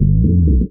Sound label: Slides; Vengeance Samples; VEH1 FX